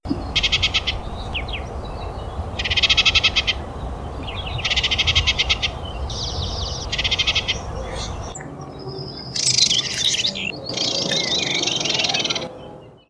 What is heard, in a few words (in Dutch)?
orpheusspotvogel alarmroep